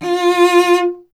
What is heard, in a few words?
STR VC F 0, Cello; Miroslav Vitous; Solo Instruments VOL-1